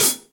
Hihat Brush Pedal; Drum Hits; Standard Hits; Club Sizzle; Ableton; Factory Packs; Session Drums Club

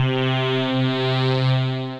Lead, Soaring Leads; Sicario's Lead; Ableton; Mood Reel

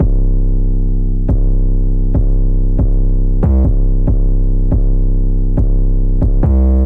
808 Bass C 70 bpm, Trap Drums; Vagrant; Trap Drums by Sound Oracle; Ableton; Factory Packs

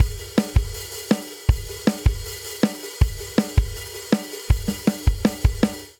Energetic Pop Outro 160 bpm; 4 4; MIDI Clips; Ableton; Factory Packs; Drum Booth

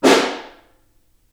Snare Far

